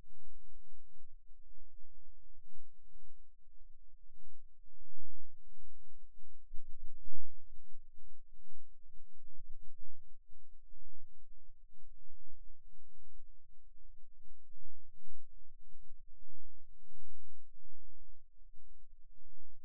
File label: Rhythm Wave, eeg seizures